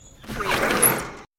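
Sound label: Slide Door Open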